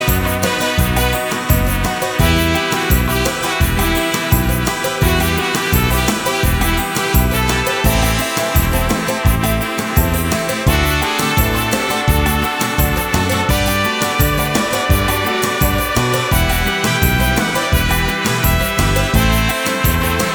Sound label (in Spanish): salsa